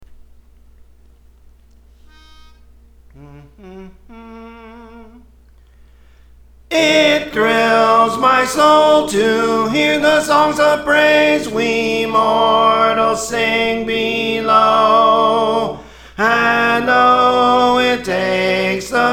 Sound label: The New Song (Tenor)